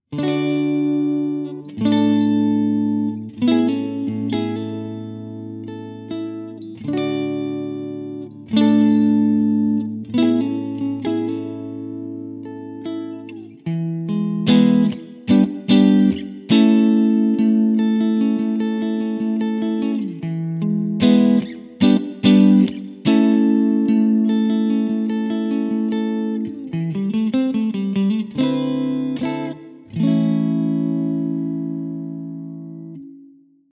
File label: clean; jensentone